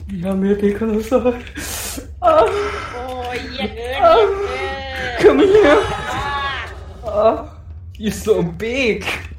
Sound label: win; thaimemes; agent pink